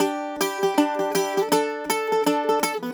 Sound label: Celtic Flavours; USB Soundscan vol.42; AKAI